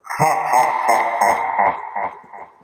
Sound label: laugh